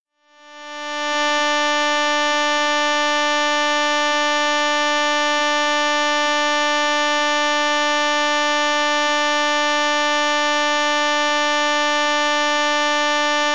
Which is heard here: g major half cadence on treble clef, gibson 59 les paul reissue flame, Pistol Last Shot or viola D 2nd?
viola D 2nd